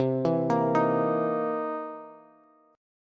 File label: C 7th Sharp 9th C Bass; arpeggiated; JGuitar